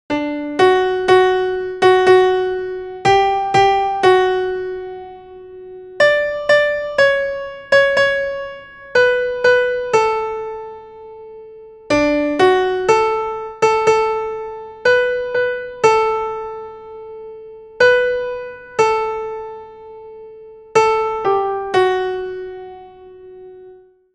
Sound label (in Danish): 2. Stemme (1. Sopraner)